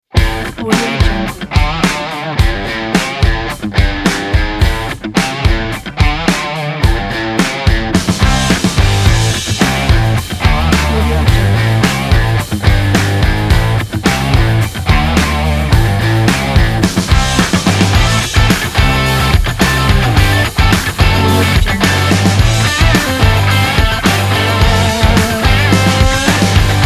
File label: driving rock commercial